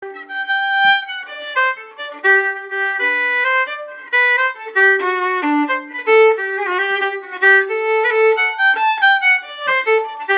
Jig faster; Cuil Aadha Jig

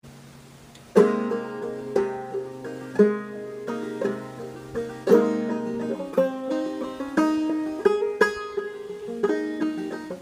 Clawhammer banjo in Ab; Soldiers Joy

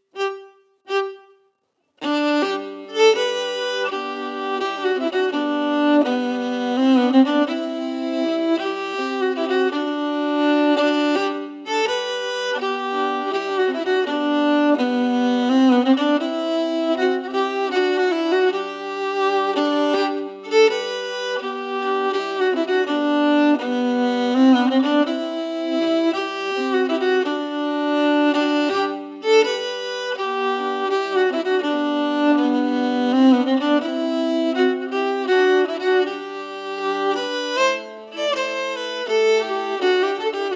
melody emphasized; Kry Pa Dig

